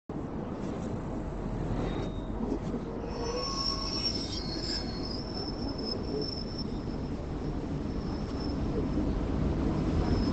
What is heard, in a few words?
train ambience; Milk outside a bag of milk... OST (2021)